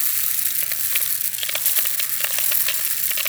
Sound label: sprinkler loop; revolution; Portal Revolution; scapes 3d